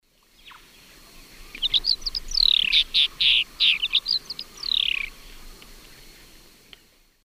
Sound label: Progne tapera, song in flight; S. America; Eastern Lowlands